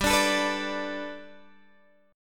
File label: G Major Add 9th Guitar Open G, chord